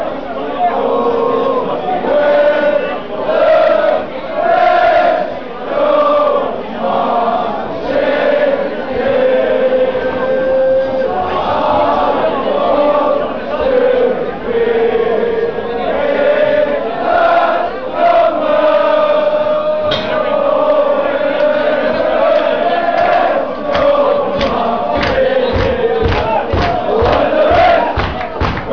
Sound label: the reds go marching in, Chants; Manchester united